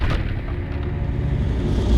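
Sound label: Music Volcano 120; from dust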